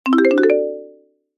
multimedia alert notification musical short marimba positive feedback; zapsplat